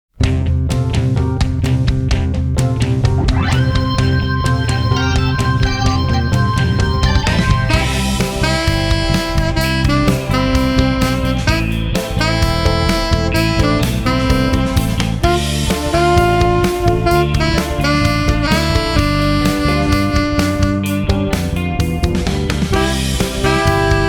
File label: SAX GROOVE